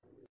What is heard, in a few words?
no sound quiet